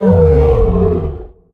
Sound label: bronto voc mumble